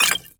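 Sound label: item potion down; Skyrim Special Edition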